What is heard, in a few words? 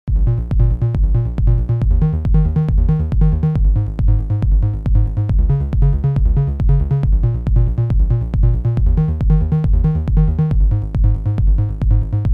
EMU ESI4000 90ies Dance